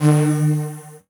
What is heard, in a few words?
Buttons Mechanical